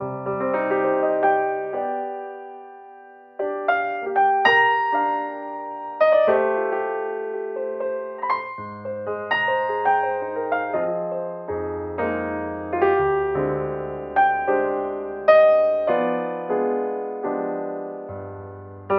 piano lament, sad loop; Loops

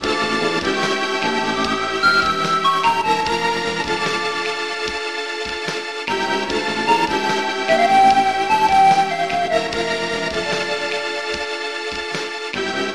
keys eight bits, jazz loops

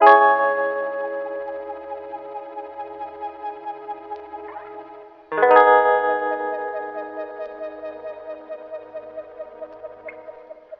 guitar, Sad

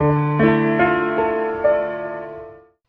piano, Sad

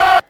ah group of men; Voice; ooh ahh

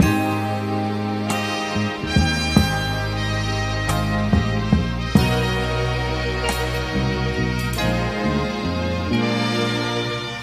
horns, phonky loops

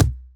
fool, Kicks Medium

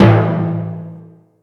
kettle, Tympany; Misc Percussion

